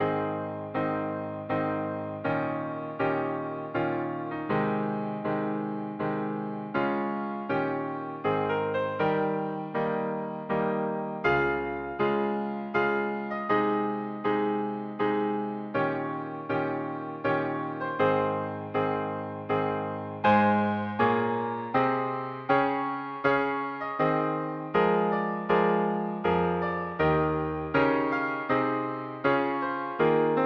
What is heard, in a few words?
handel xerxes, clarinet